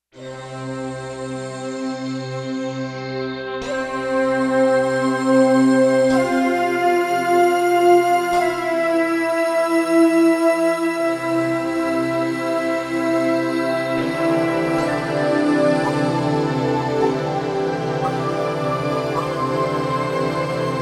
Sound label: New Age Jam